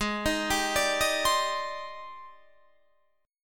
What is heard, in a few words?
Ab Major Sharp 11th Ab down Guitar Standard; AbM#11 arpeggiated; JGuitar